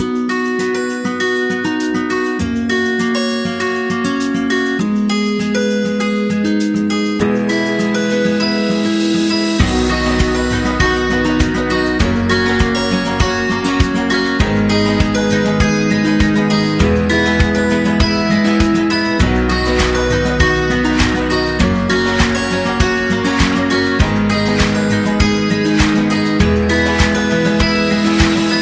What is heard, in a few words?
Acoustic Background